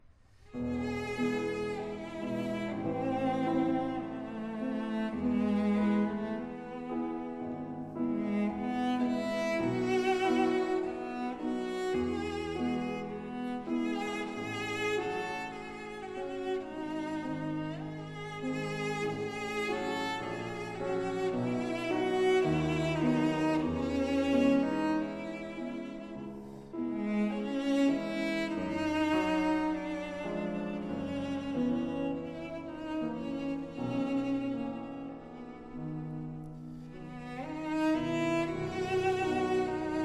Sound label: Piano Quartet No.3 c-moll; Brahms; Op.60